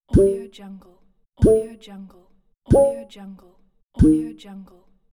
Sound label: menu interface sounds